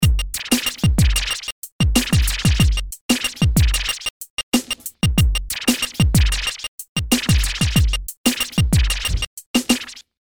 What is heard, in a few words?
Kit; emu mo phatt